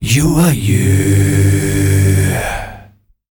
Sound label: male 5ths, S. African world voices; ILIO; Vocal Planet VOL-5